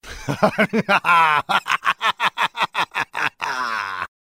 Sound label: laugh devil